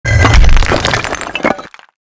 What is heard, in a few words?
fence stone; World of Tanks Blitz